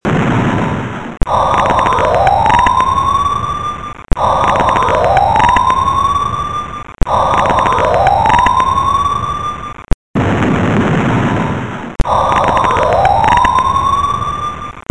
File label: tandy dac, dosbox sound emulation; simcity